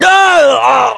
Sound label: death